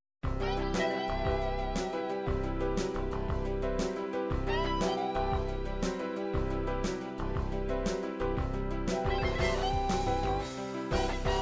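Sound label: 6 8 heavy rock, midi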